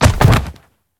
bodyfall

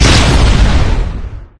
Vehicles

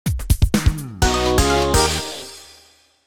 Music Champion

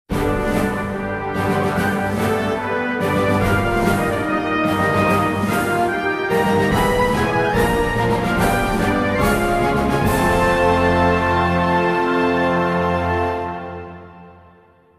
Minor Victory; Fednet; starship troopers soundtrack 2005 pc

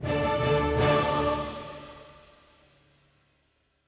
end victory; Fort Triumph

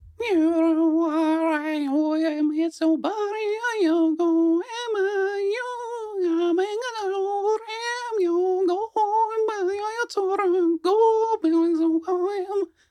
ambient loop; Gorb

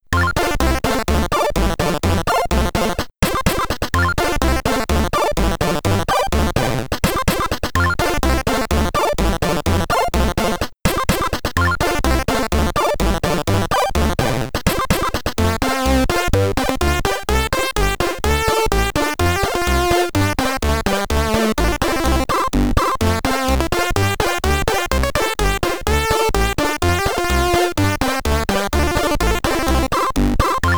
Stage BGM, SID Stereo, commodore 64

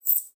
Coins

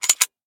get artefact gun; Blackeye

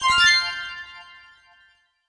Withdraw; Nexomon

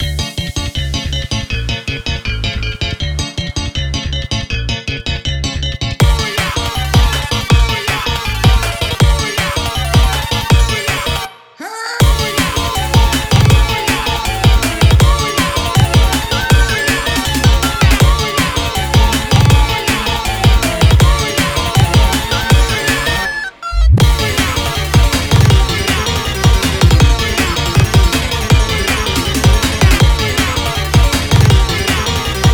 Instrumental

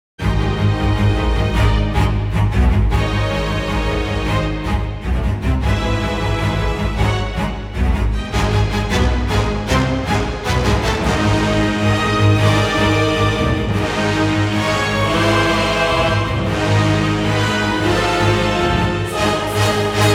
Boss Fight

